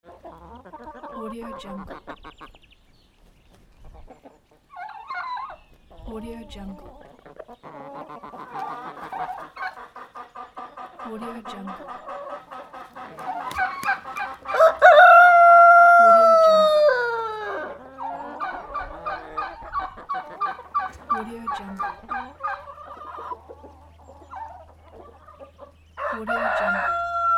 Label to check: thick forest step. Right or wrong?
Wrong